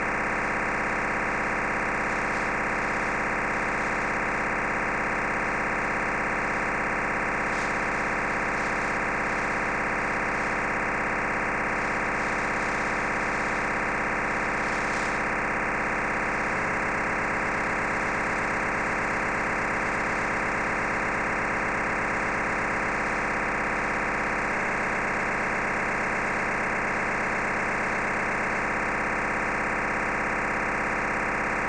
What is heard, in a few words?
DMR Control Channel 454580kHz; SDS100; recording of DMR signal